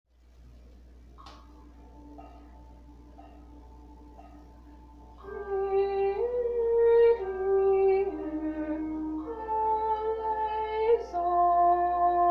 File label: contralto; kyrie